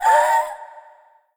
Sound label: ghast death; Crypt of the NecroDancer